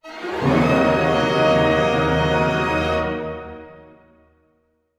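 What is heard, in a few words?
orchestra; Masterbits - Switch FX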